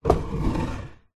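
furniture large quality wooden sideboard drawer close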